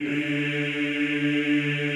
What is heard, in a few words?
EEH D2, Classical Choir; Best Service; Extended Classical Choir